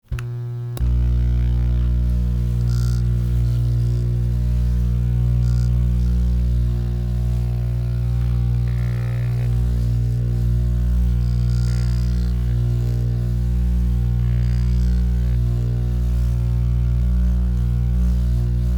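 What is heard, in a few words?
massager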